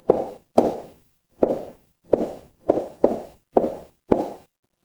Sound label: footsteps concrete